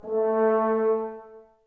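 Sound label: brass horns A; Sample CDs; Miroslav Vitous; Woodwind Brass Ensemble; F.Hrns Links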